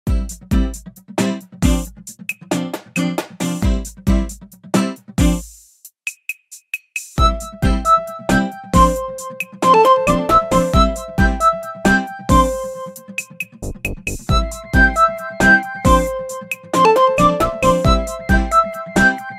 Title Theme; SMM2

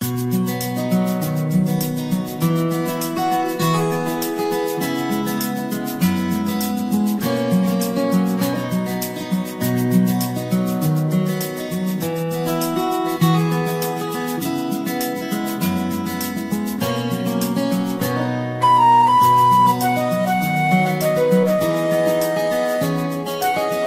Scrapbook Theater

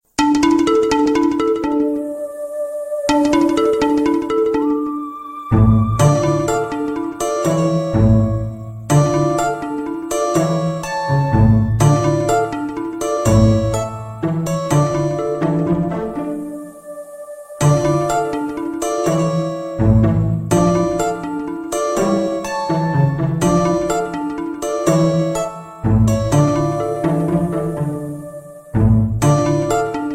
Ghost House Theme; SM3DL

